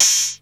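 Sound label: KPR open hi hat, drum; Crypton - DrumBase5000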